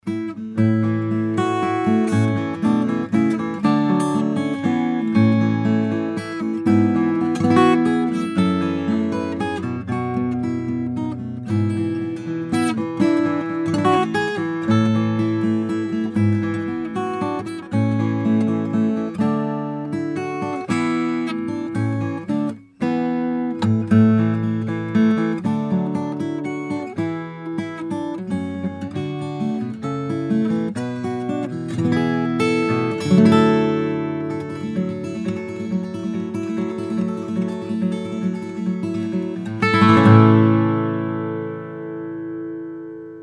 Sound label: mic test guitar